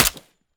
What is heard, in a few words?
mech, m16 weapons; Urban-Coalition; Decay; arccw ud